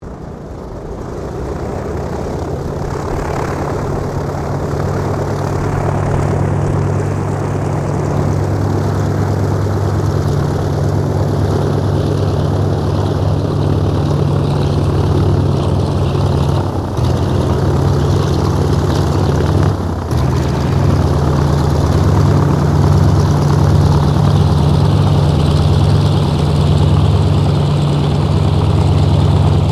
AIRCRAFT LOCKHEED HUDSON TAXI SHUTDOWN, twin engine light bomber; Airplanes